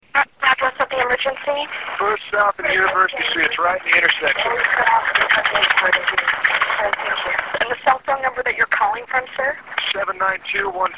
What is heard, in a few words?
collision